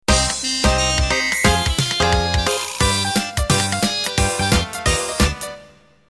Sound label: Flapper intro